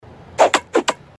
double slap

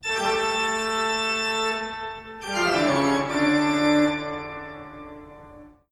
toccata evil organ; bach

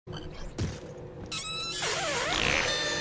unicorn gun; Red Faction Armageddon

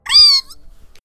ree follow alert

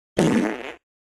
hairy truck driver fart

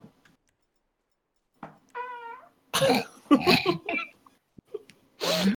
farty; farty lad

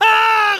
scream

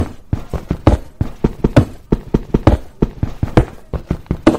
stone; minecraft

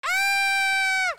scream; tfue

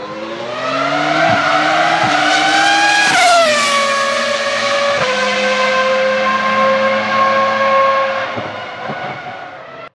ferrari f2002 f1 v10 engine pure sound

